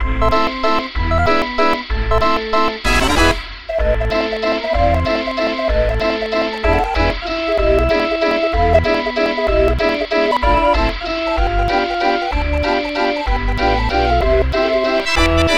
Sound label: mazurka